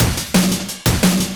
175, Breaks; Drum&Bass; EZ Rollers

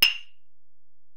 EPerc, Alesis DM-PRO

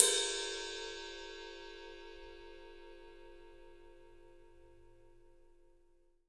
ride cymbal; 3D Sound; 3D Drums

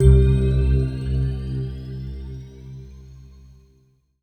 synth bell

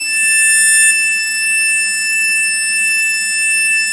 YAMAHA CS80 PWM, Vintage Keyboards; Big Fish Audio - First Call Vintage Keyboards

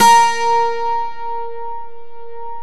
12 STRING; E-MU Producer Series Vol. 2; More Studio Essentials; Composer

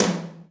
Tom D2, Roland U220

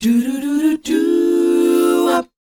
DOWOP G, Vocal R&B DOO WOP; ILIO; Vocal Planet

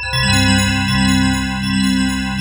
SPARKLES; Sound Gallery - Charged; DIGIT WAVES

